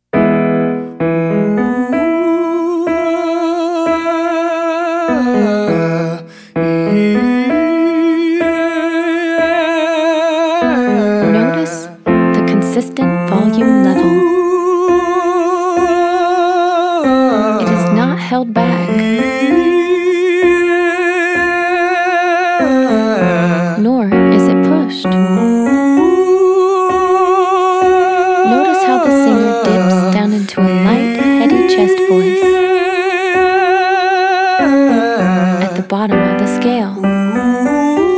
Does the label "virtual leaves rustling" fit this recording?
No